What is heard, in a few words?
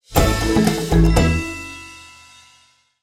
win medium; PushGaming; Peter And The Lost Boys; peter Pan